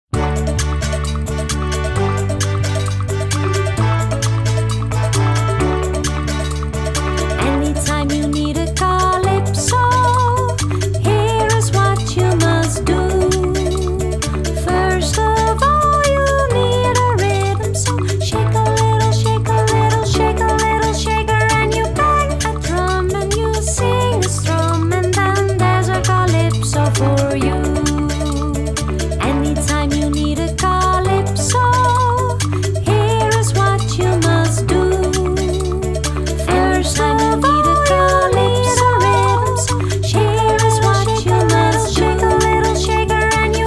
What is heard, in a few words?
Calypso